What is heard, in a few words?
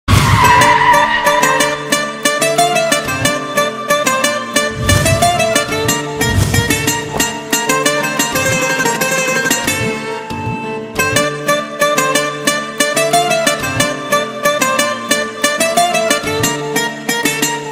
Instrumental Ringtone